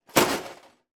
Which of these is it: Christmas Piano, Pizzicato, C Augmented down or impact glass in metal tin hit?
impact glass in metal tin hit